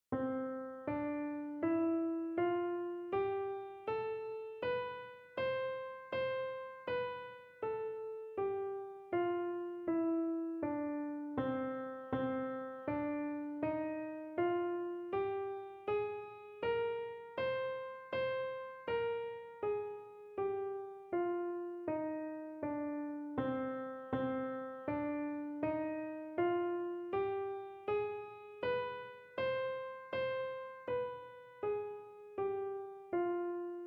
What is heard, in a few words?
Comparison Major Minor